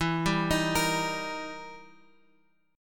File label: E Minor Major 7th Flat 5th, E, down, Guitar, Open G